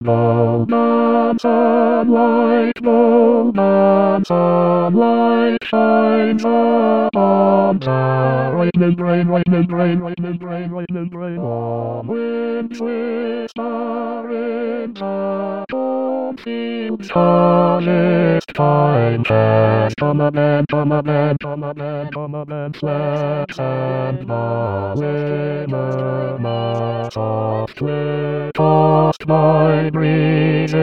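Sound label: bass; Dvorak; Songs of Nature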